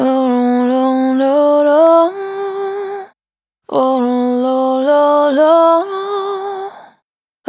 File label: Vapor Dry, Single Voice Melodic Loops